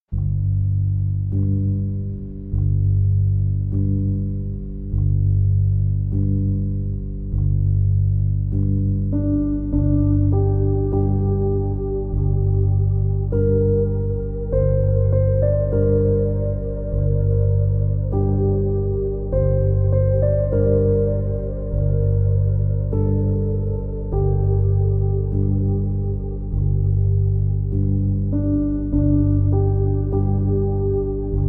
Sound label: Piano Version